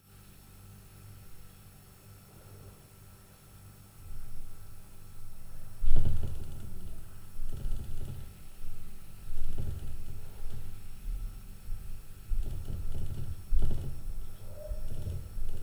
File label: LF Upstair, Night Noise